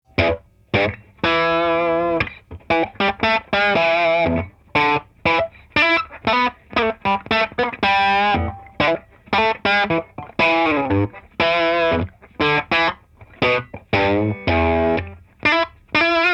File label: dm drive blues